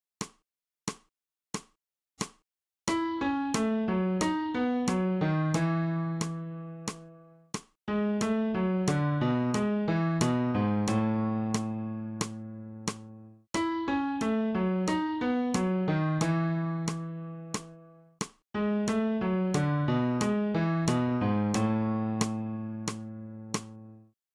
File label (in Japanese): 新フレットレス